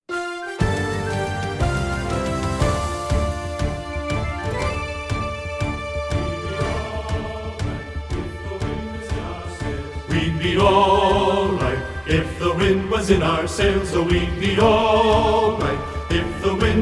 T(T)B (Baritone Dominant)

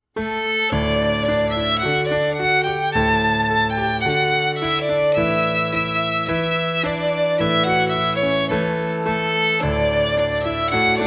Strings, Solo with Piano; Simple Gifts

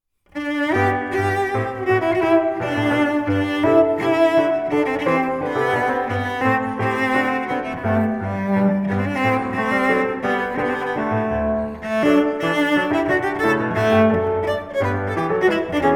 Cello Sonata in G Major - I. Allegro non troppo

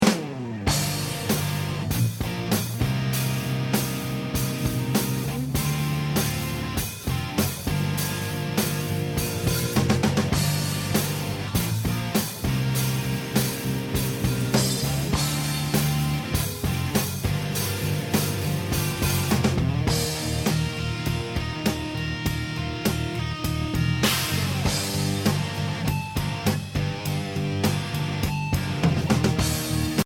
Minor Funk Jam

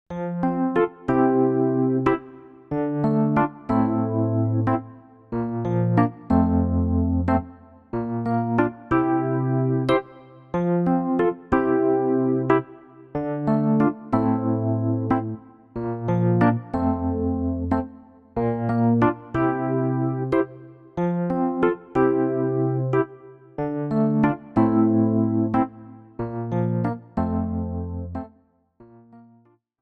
Accompaniment

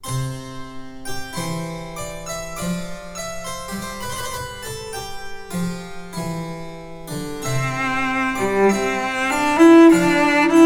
Cello Sonata in C Major - I. Adagio; solos for young cellists

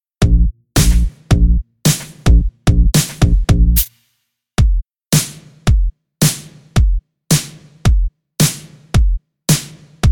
Accompaniment

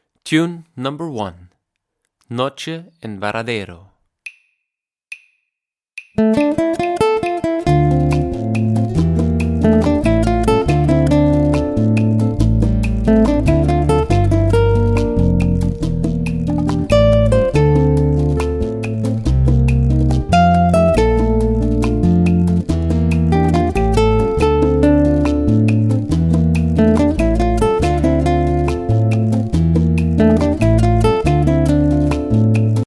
Cuban Latin Guitar

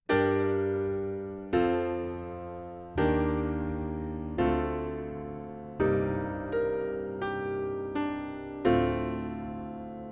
SAB (Alto Dominant); Follow the Drinking Gourd

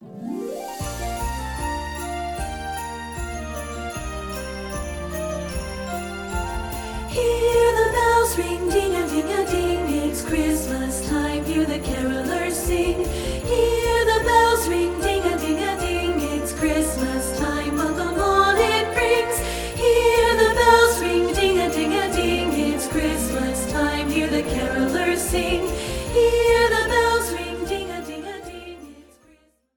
3-Pt Mixed (Part I Dominant)

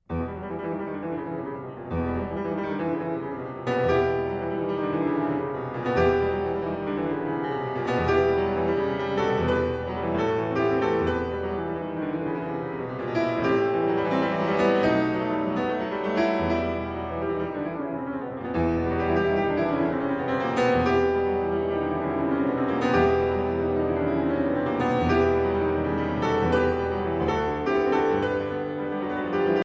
Moment Musical In E Minor; sergei rachmaninoff

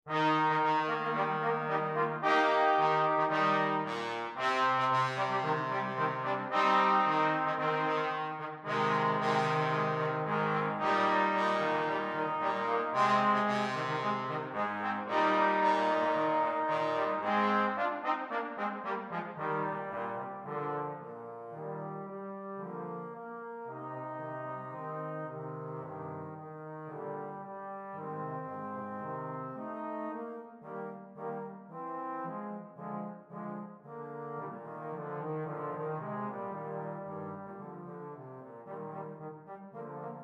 Fanfare

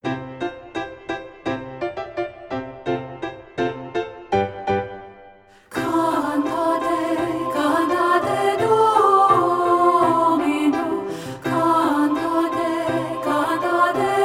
SAB (Alto Dominant)

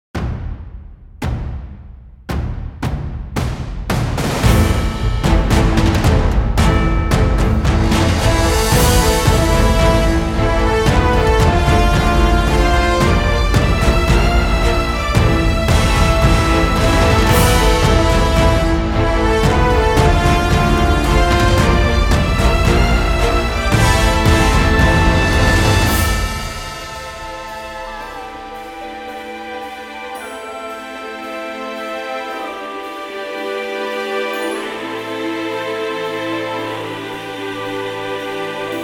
Backing Track

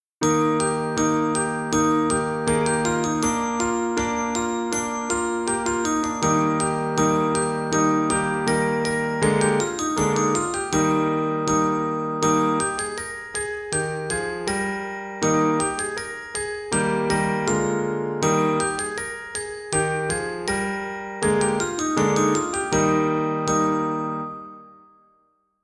Percussion - Line A Solo with Piano; The Rakes of Mallow

